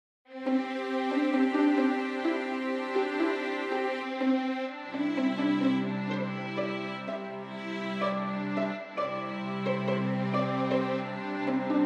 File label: pentatonic piece